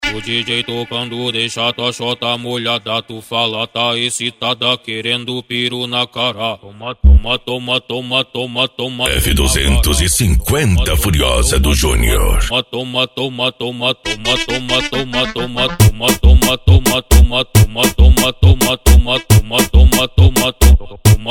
funk e sertanejo

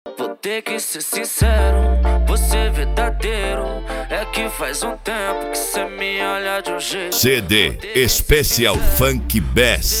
cd especial funk bass

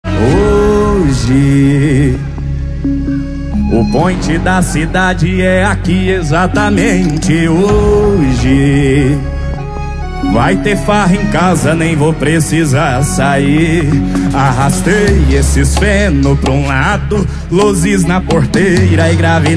sertanejo com locutores de rodeio

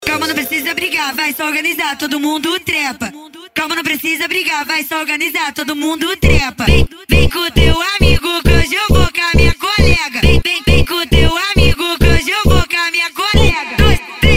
carreta suprema funk

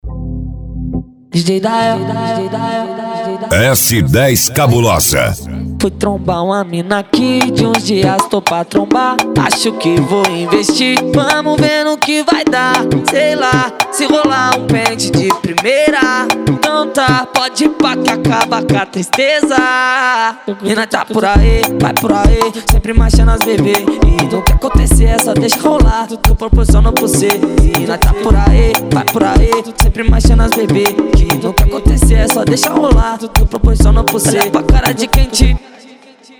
funk comercial

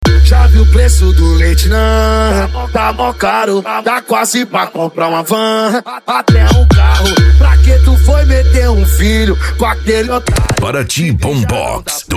bombox funk

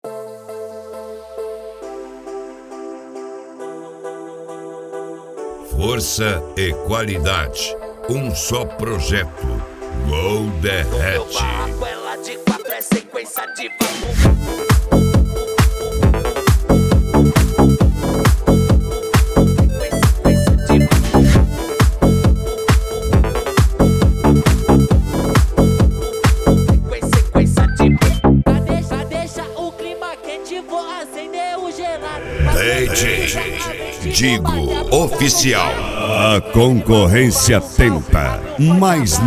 mega funk

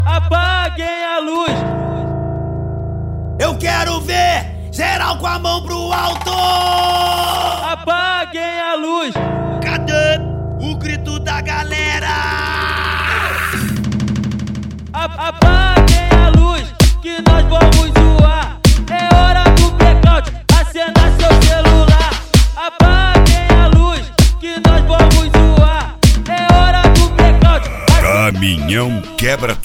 eletro funk; frequency mix

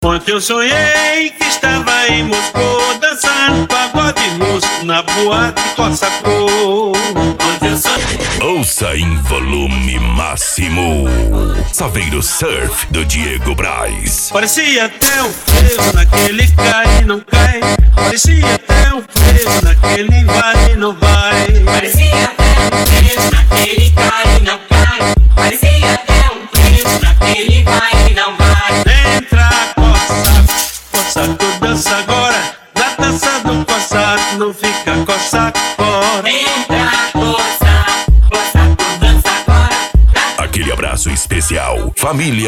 funk consciente e funk ritmado

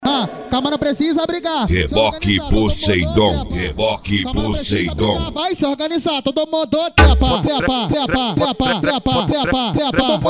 sound car; reboque poseidon sartori

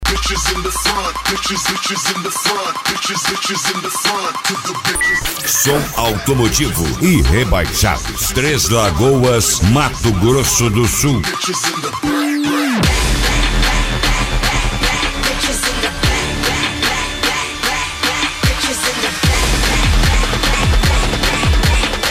som automotivo; rebaixados

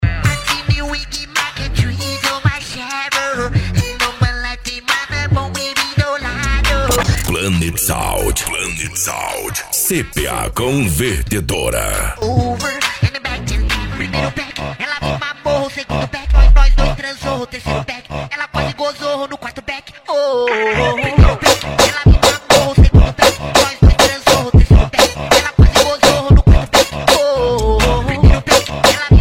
funk ritmado; cuiaba mt

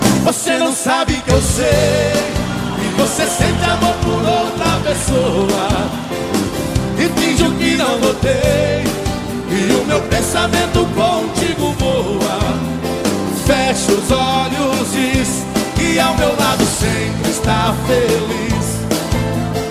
moda de viola; frequency mix

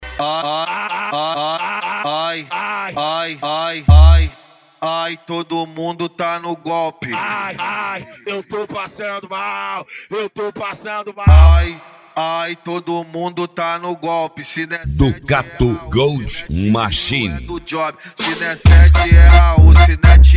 especial funk

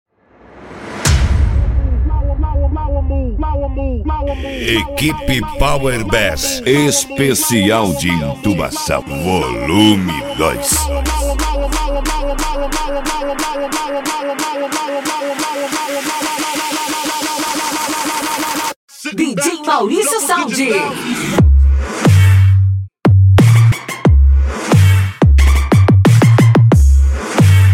entubacao

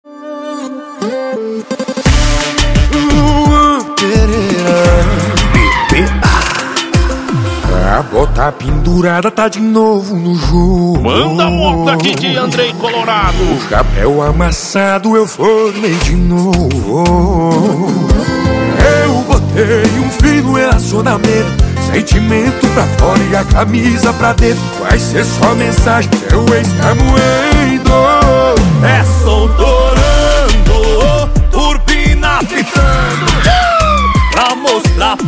sertanejo com locutores de rodeio

